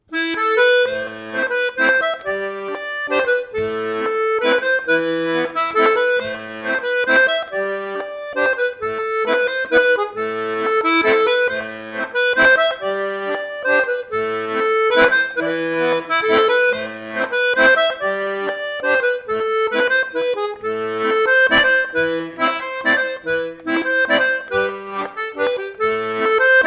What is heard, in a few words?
mazurka